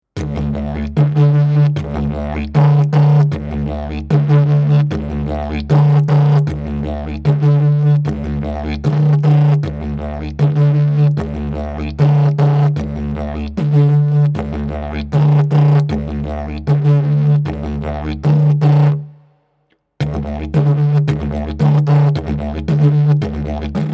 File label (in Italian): ritmo livello avanzato